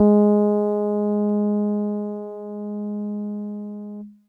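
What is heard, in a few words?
EAcoustic DX100 G#5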